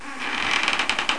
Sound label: door open